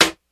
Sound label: sharp rap snare one shot E key, steel snare drum sound tuned to the E note